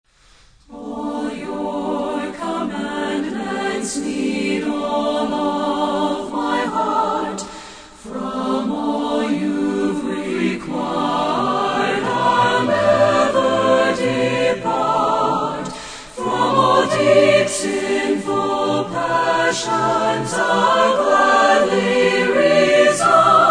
Choir And Orchestra

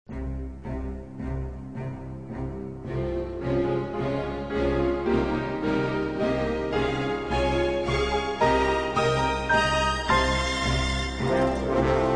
Orchestra, Strings

